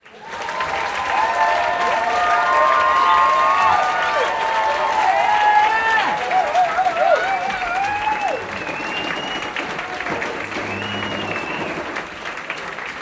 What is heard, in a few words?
human audience comedy club x200 people cheer clap rising in intesity accolade; komedia brighton comic